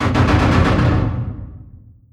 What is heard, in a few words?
distance mechanized units mg fire; Hearts of Iron IV Death or Dishonor